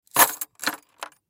Key Ring Set Down; Table; foley; Set Downs; Objects; Blastwave

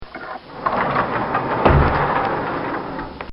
Sliding Stall Door Open

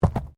Body movement Fall Medium

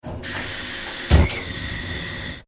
household cooker gas hob ignite; Gas Cooker Hob / Burner ( Domestic ) - Gas Released Followed By Ignition Spark Then Ignite; Kitchen; McKinney Sound

